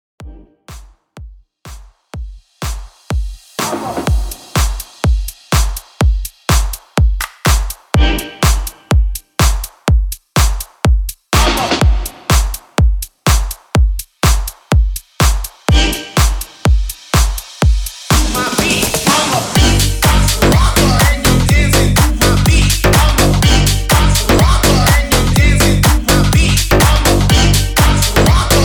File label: HOUSE 124 BPM